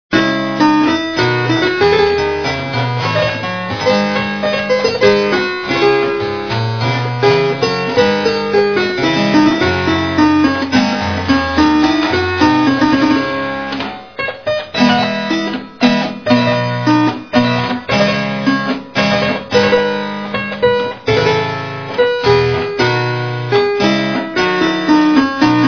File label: courante; daphne